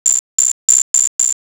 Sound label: insects